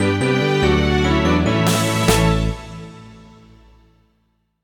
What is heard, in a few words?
1-Up; Sonic Robo Blast 2 v2.2